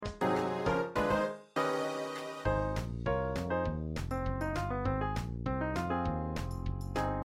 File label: Accompaniment